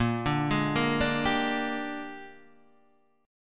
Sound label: Bb 13th, Guitar, Half Step Down